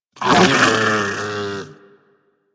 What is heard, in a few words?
Armadillo Vocal Snarl; Characters; Warframe; Hunting Critters